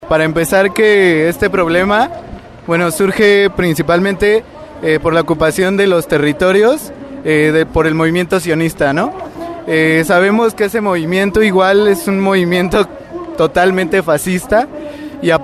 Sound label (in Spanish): marcha; palestina julio 2014